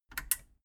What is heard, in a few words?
metal button radio push power off